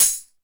DYNA PERC; Crypton - DrumBase5000; DYNA KITS

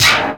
PERC FX, Dance Drums

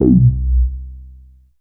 EMU LONG, A BASS; A-BASS 2

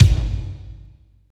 KICK, Tekno Industrial; East West

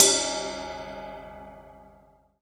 CYMBAL, RIDE; Sonic Foundry (Sony Creative Software) - Loops & Samples Drum Tools; Laid Back

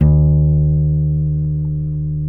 E 2A NYLON, Guitars; Spectrasonics; Hans Zimmer Guitars VOL-1

